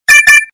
Tone, Message; i-mobile ZAA 8 WiFi

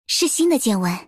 Notification; OnePlus; Ace 6T; Genshin Impact; Kamisto Ayaka

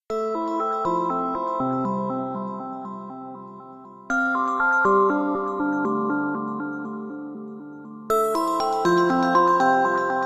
Morning Clock, alarms

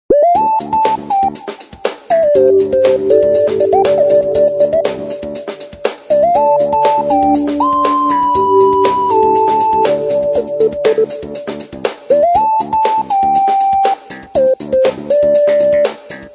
Sci-fi, ringtones; Okapia; Spark

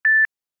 Beep Once, notifications; Samsung; Galaxy J4 Core

